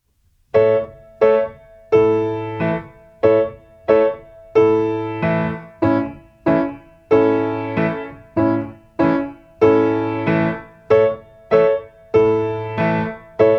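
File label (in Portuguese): Improvisação Cordas LÁ e MI, Violino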